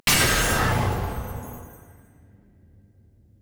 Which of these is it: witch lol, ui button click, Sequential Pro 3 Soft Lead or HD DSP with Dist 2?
ui button click